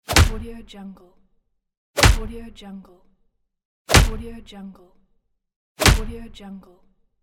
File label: melee attack; game